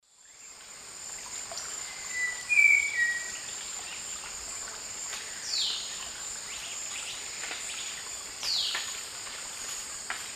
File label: Habia rubica, song; S. America; Eastern Lowlands